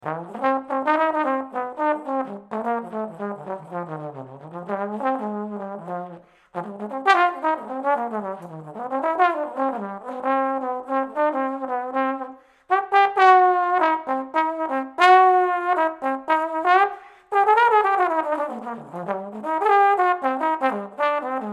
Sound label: Trombone